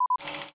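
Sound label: ui video record, ui sounds; Motorola; AURA R1 Celestial Edition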